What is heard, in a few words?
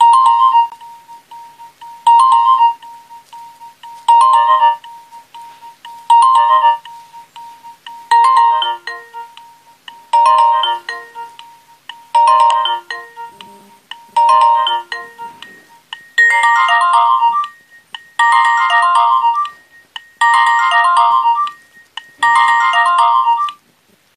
Clock alert